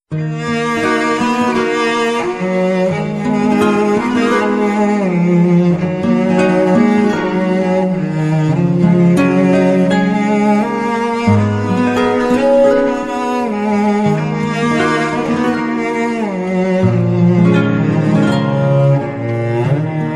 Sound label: sad and slow violin